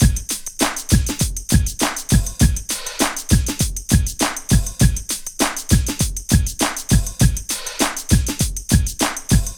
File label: LOOP1 L, Drum Loops; Big Fish Audio; Prosonus 500 Drum Loops